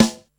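old school natural snare drum a key, tuned to the A note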